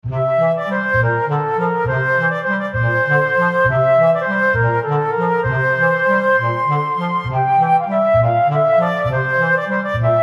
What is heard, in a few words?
Indoors One, RPG; The Frontier - RPG Set